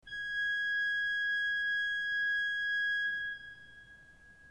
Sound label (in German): ton, orgel